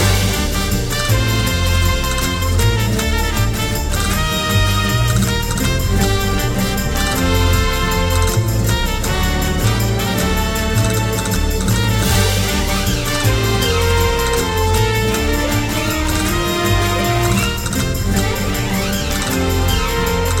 market music loop; The Adventures of Puss In Boots - Barrel Run; NextlevelGames

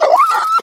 Dog Whimper; Adventure Time - Gate Crashers

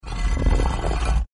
drag stone; Legends of the Hidden Temple - Unlock the Past

